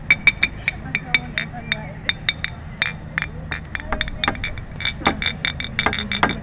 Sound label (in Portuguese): Batida Garrafas